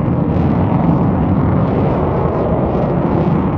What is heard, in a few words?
distance airplane jet idle; Hearts of Iron IV Death or Dishonor